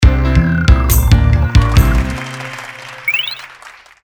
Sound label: roulette